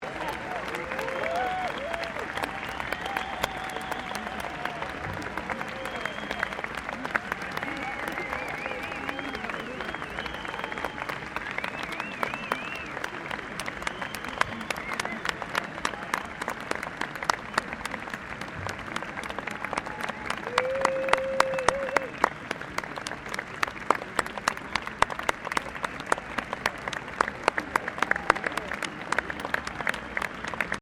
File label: Crowd Large Outdoor Event Applause, Outdoor event crowd; Human